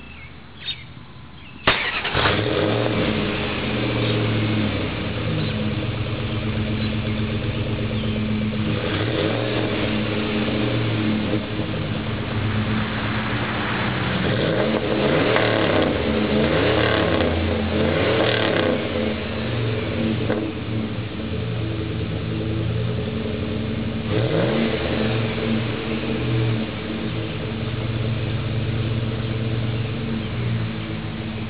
Muffler at home revving, dodge aero-turbine muffler